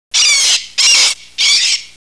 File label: seagull